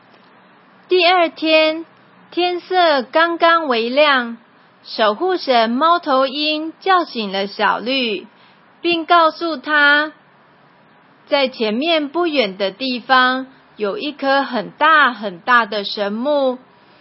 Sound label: music